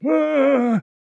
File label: man injured groan; soundscrate